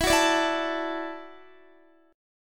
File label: E 7th Suspended 2nd Suspended 4th E down Guitar 4E 4A 5D 5G 5C 6F; strummed